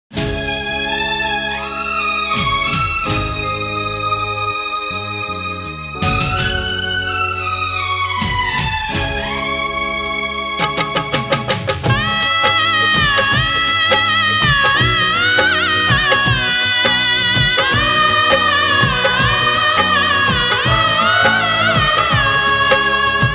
Song Instrumental Tone

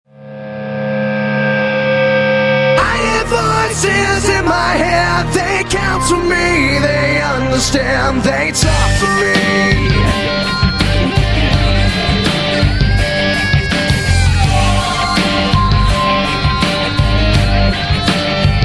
Theme Song Ringtone

